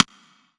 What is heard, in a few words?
Korg N1R Metronome Click